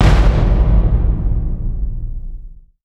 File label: big hit